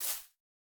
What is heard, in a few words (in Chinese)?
杜鹃踩踏声